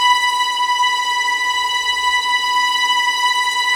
tremolo; Level4 Hidden World

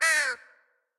Ambient

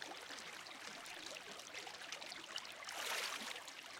Scoreboard Loop; Candy Crush Soda; Gofishing

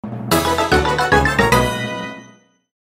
All Medals Jingle

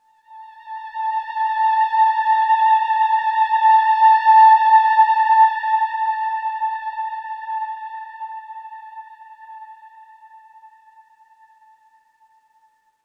Oneshot Synth

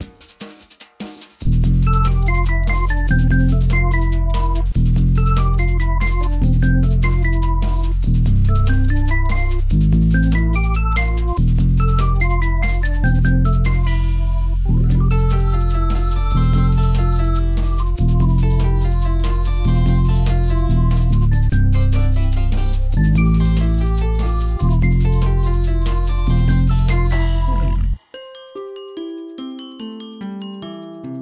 Sims Heartbeat; The Sims 2

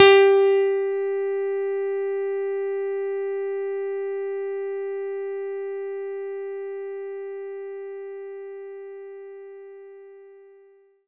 CP 80 EP G4

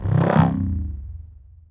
Wobble C

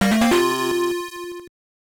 Missile Expansion Collected; Metroid 2 The Return of Samus; Gameboy rip

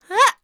RABBIT JUMP

